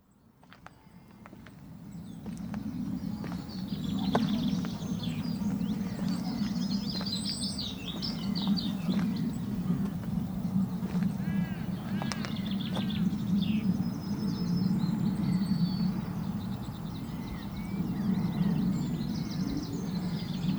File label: Walk Soundscape; Cwmdu